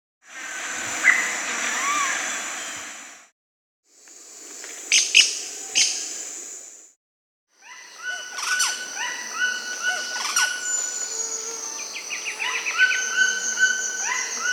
Black-bellied Cuckoo (Piaya melanogaster), Brazil, Bolivia; S. America